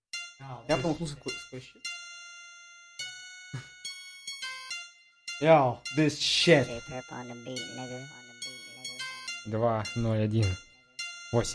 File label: Instrumental Remake